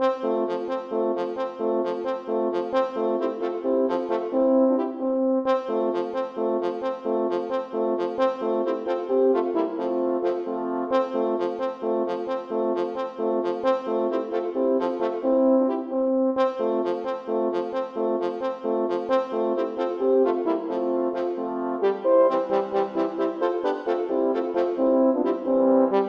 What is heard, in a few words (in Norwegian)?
Jagtstycke - for fire horn; Aamodt; Dronning Louises Jagtstycke